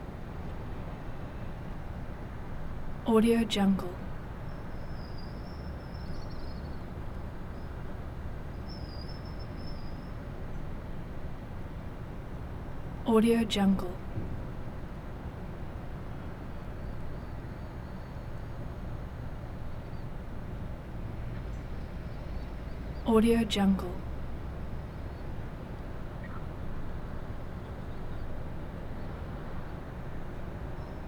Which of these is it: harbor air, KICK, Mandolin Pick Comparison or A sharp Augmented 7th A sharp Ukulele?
harbor air